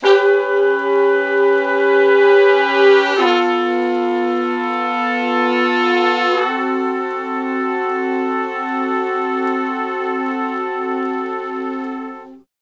Slo Blues Chords [V, IV, I, I] ( D), Horncraft for R&B, Sections; Sonic Foundry (Sony Creative Software)